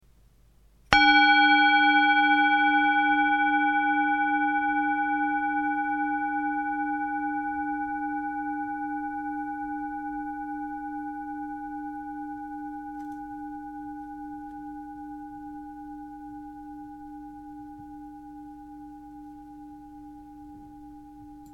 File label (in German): Klangschale